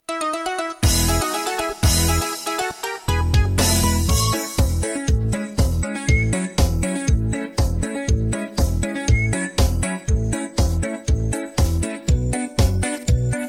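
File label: backing track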